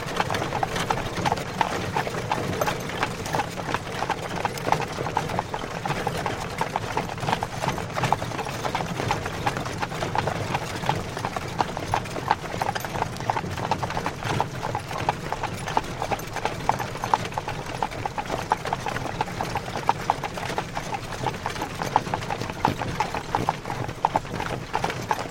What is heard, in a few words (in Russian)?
konnaia povozka